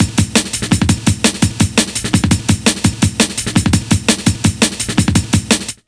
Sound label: LOOP, Explosive Jungle